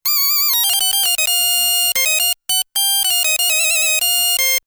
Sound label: funky